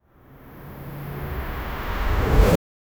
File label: woosh for putting on dron